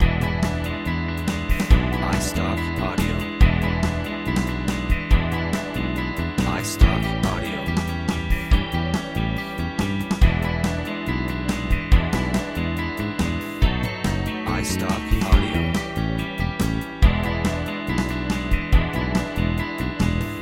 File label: blues loop